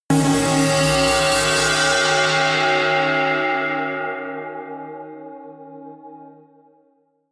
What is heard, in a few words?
bitslice synth